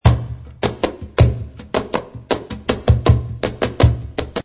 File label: SOLEA 80, flamenco